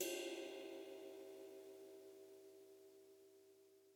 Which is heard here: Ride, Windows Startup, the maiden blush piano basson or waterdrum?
Ride